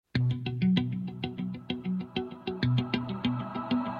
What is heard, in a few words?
Bass Sms